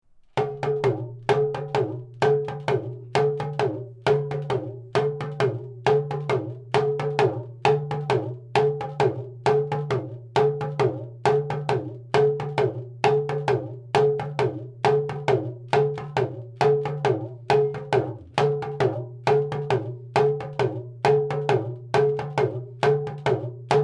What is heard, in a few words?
Lunga Drumming, dagomba